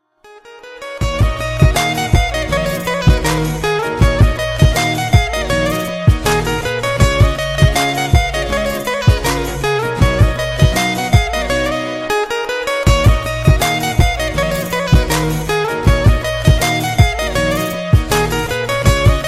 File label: Guitar